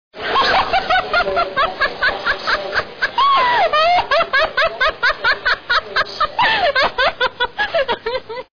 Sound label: hahaha